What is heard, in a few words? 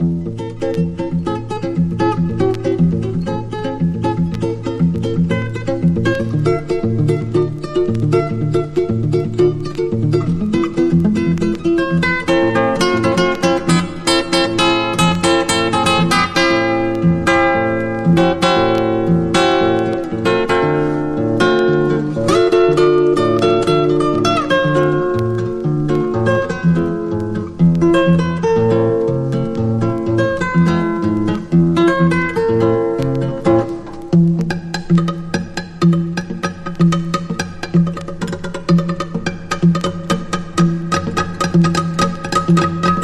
BATUCADA